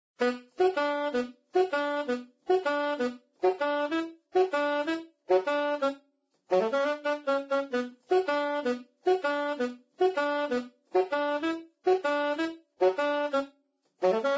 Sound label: Saxophone